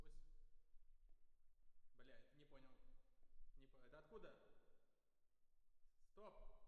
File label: Perc